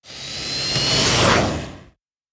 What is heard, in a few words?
Stereo Aircraft, notifications; Lenovo; ZUI 13